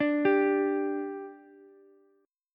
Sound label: G 5th G Ukulele, chord; G5 arpeggiated